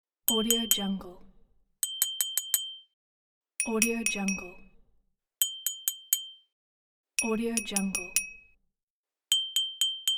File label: glass being dinged